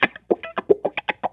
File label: Cool Wah Run 113; Mo' Phatt; Digital Sound Factory Mo' Phatt